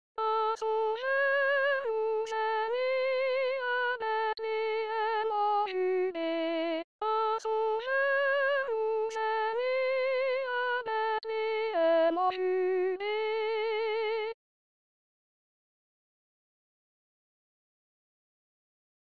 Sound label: soprano